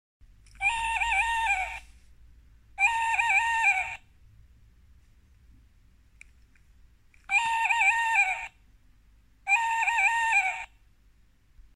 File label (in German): Waldkauz Ruf